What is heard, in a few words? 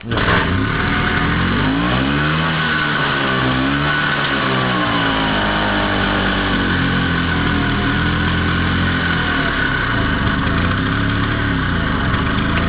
vespa sound